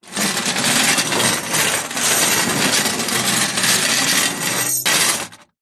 foley keys large bunch prison guard drag over metal surface hollow